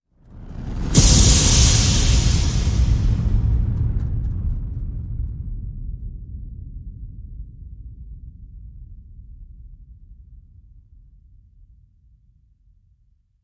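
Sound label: Hit Hor, horror hit; Sound of Peril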